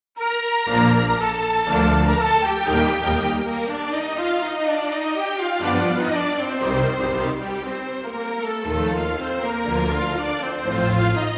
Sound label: Horn; Gliere